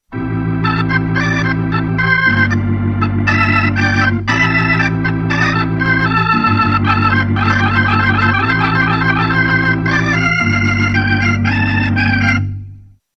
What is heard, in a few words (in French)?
orgue electrique; instruments